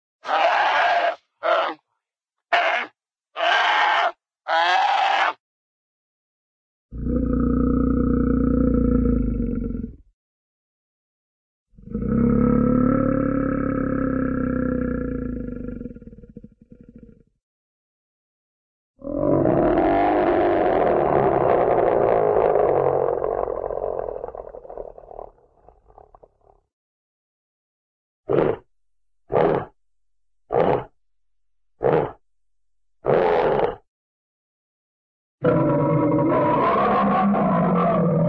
bear cub groans and barks, animal; cartoon

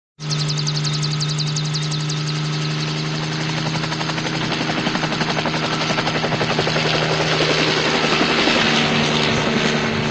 helicopter ext idle and take off; cartoon

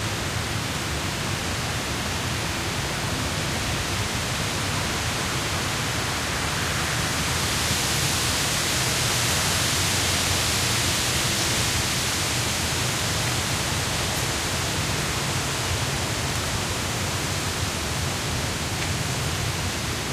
jungle wind no life; Source Engine; tf; lair

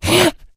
become alert, infected

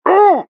pyro taunt head pain; tf

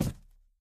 phys cube, physics

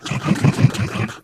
ballbot impatient

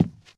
cardboard, footsteps walk; player; source engine; left 4 dead 2; boomer

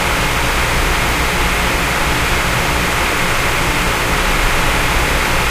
inside tape machine; video games; ambient; Source Engine; tf; lair

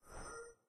windows restore; operating systems

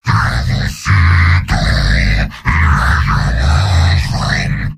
singing; heavy; source engine; tf; mvm

